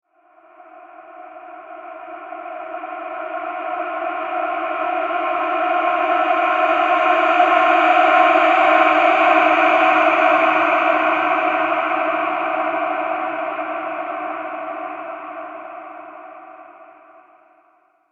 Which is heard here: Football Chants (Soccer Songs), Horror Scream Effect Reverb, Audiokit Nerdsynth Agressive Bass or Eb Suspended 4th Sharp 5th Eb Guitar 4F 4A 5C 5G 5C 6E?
Horror Scream Effect Reverb